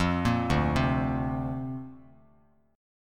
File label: D-Minor 7th Sharp 5th D Bass Standard, chord; Dm7#5; arpeggiated